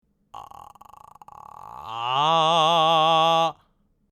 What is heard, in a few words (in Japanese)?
エッジボイス→地声